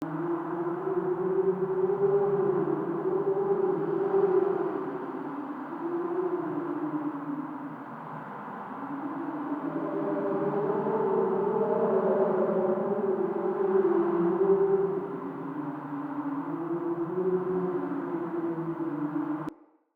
Cave Amb Loop, ambience; Caves; Glades Of Andurin

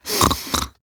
Snores, Grandmother, Vocalizations; Warframe; Deimos

